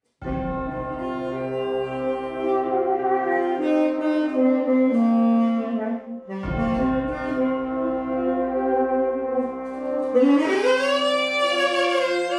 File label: Birthday deliverance and BC solo